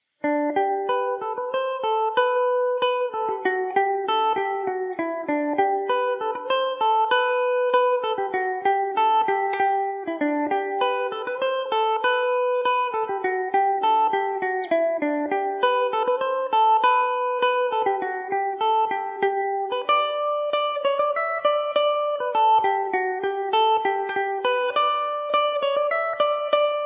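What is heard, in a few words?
G major revelation, Irish mandolin; banks of the quay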